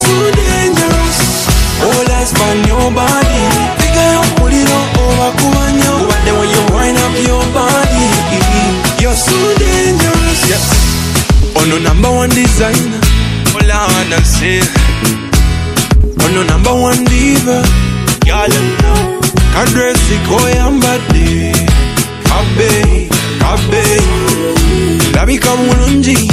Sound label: best ugandan ringtone